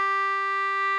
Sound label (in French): sol, cloches